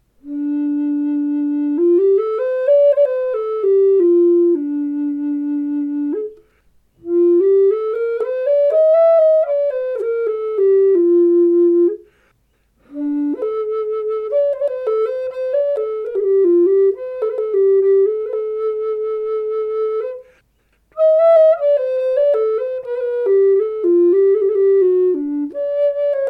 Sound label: D4 a432 Flame Box Elder, flutes